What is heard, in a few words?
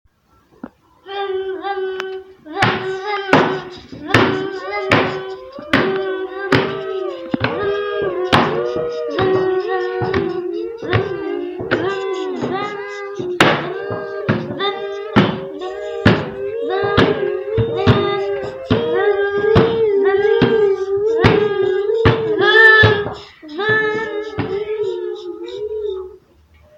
Nightingale